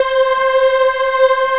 C4 child EH L, Choir; USB Soundscan vol.28

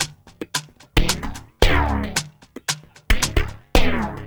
SHKR; Spectrasonics; Liquid Grooves 1 & 2

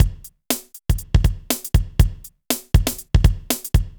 Synth Loop, BPM120

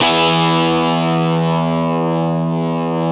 GTR, Metal Chds; Club-50 - Foundations Roland; Power Chds

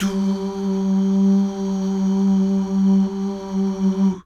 DUUH

